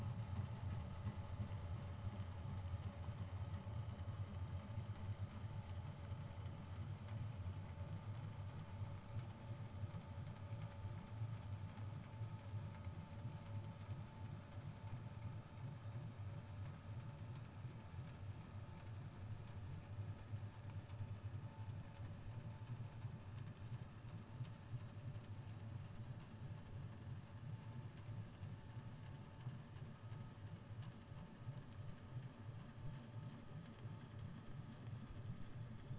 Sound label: HYDROPHONE 16000Hz; JASCO AMAR HYDROPHONE 740